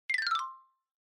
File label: Xylophone, Fast Short Down